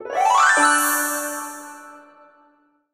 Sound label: freespin end; Amatic; Fortune Girl